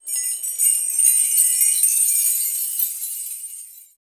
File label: Door Keys; Roland BK-5; Percussion